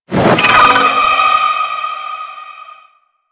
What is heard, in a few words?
Projectile Charge; Enemies; Abilities; Sentient Brachiolyst